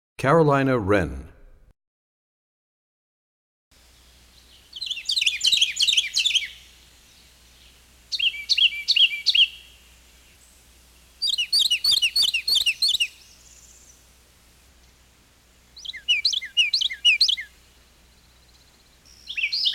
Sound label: Carolina Wren; Animals; Birds; 101BirdSongs